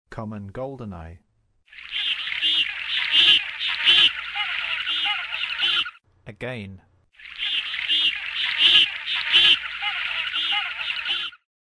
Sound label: Common Goldeneye; North America